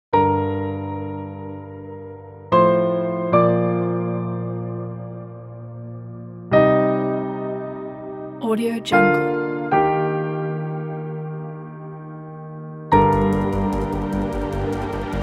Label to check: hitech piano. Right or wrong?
Right